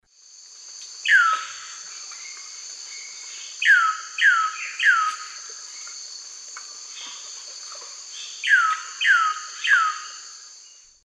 Cacicus solitarius, song; S. America; Eastern Lowlands

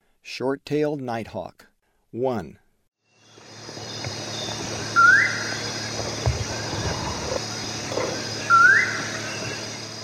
Short-tailed Nighthawk; S. America; Eastern Lowlands

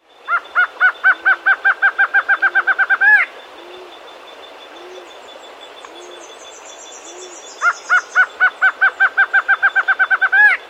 Streak-Backed Antshrike; S. America

